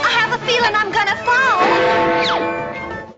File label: fall; quake2; mpitstop